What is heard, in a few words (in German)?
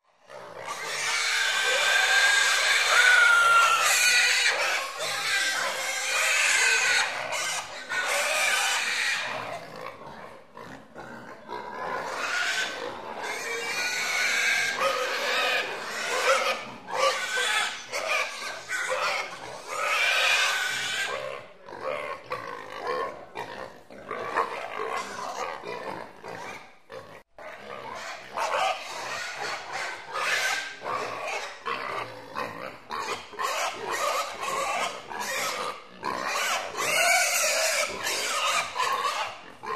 Schweinestall; Tiere